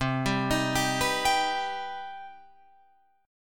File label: C Minor Major 7th down Guitar Open F; arpeggiated; JGuitar